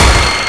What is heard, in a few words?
minigun end